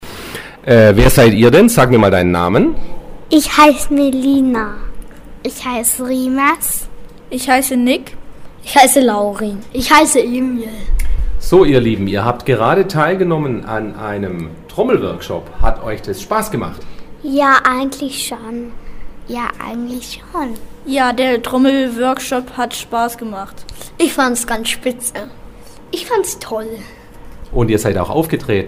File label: Kids on drum Workshop